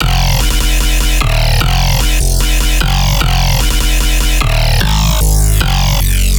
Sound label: Bass Loop F Deeper, Dubstep, 150bpm; Ghosthack; Dubstep Sound Arsenal